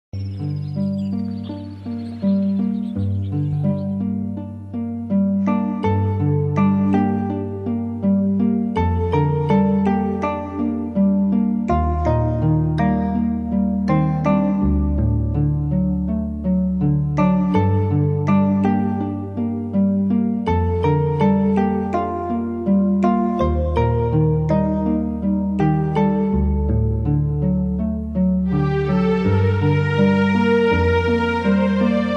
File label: sad music